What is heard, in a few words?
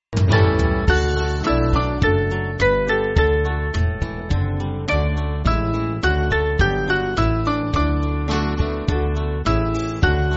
Band, church music